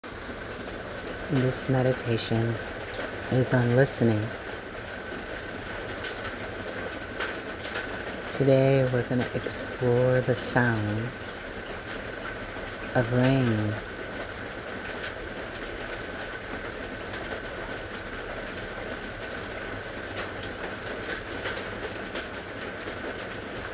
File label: listening to rain meditation